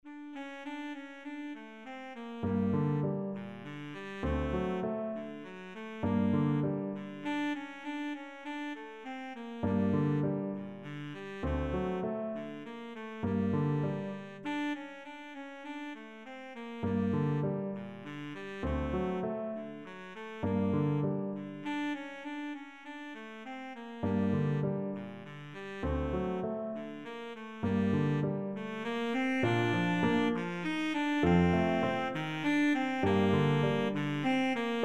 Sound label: fur elise, baritone saxophone; beethoven